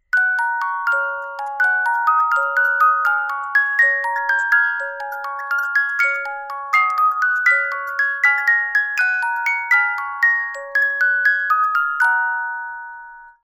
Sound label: Sleeping Beauty Waltz, musicbox